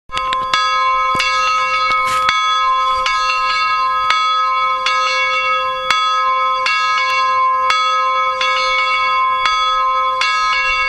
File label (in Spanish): campanas Angelus; Buenafuente